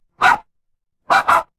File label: animal dog bark